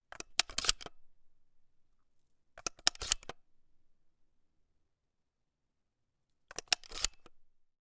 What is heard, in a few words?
weapon gun; charles daly